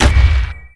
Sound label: refinery start; garrysmod